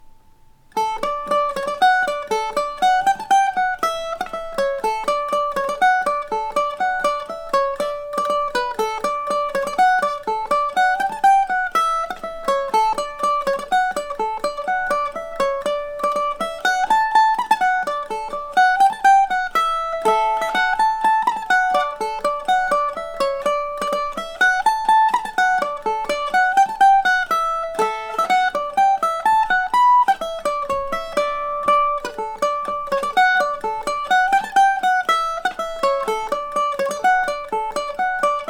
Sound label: tiny butler, mandolin